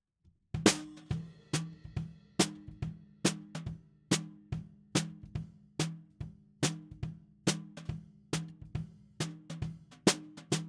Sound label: SNR BTM